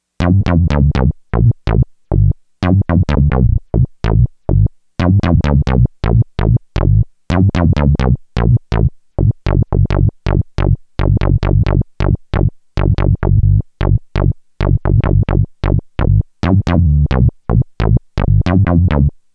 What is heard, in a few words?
octavius squeezer dwnsaw, bass